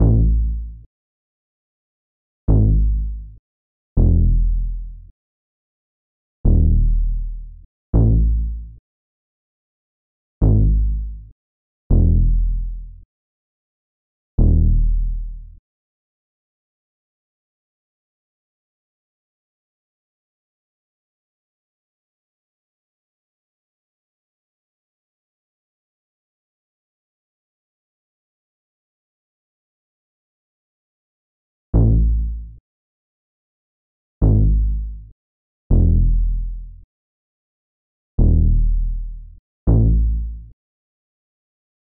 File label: 121 BPM C# Min Bass; Loop Stems; Cymatics; Ashtray